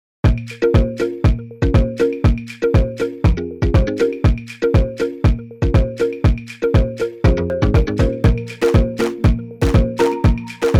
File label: African Safari Beat